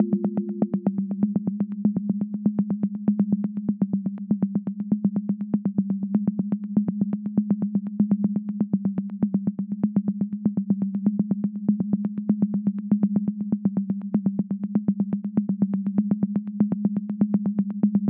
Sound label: 122 BPM Sequence D maj C min Tonic G; mmb studio soundtrack essentials